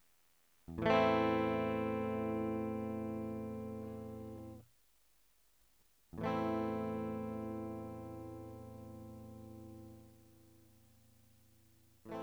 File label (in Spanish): Volumen, Guitarra